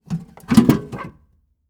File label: toolbox open; prosoundfx